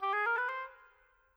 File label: Oboes; Sonic Foundry (Sony Creative Software); Orchestral VOL-2; Modern